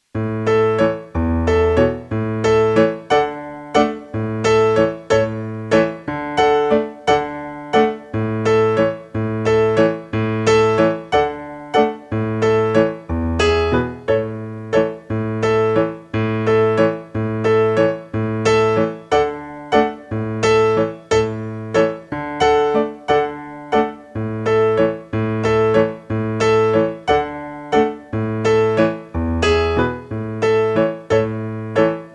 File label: Syncopated Rhythm Accompaniment